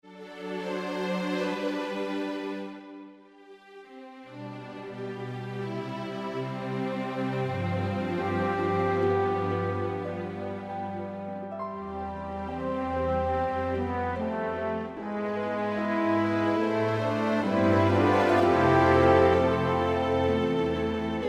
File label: Orchestral, Yamaha PSR-3000; Factory Demos